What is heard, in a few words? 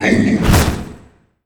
Boss Screem; Pac-Man Championship Edition 2 Plus